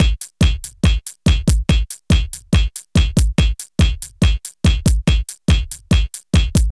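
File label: drum loops, right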